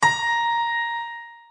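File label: A#3 piano; notes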